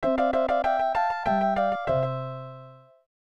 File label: Song Lullaby; Sword World SFC; SNES